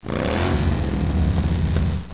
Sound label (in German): trafic